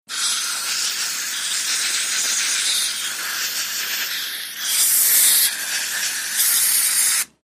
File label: Dentist Water Jet